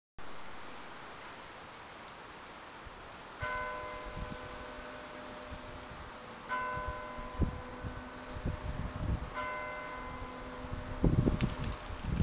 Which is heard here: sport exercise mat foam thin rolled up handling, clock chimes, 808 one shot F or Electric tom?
clock chimes